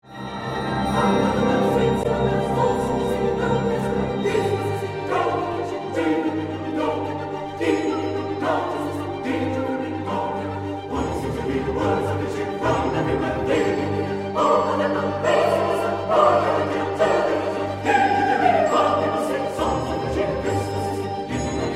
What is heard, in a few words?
Carol of the bells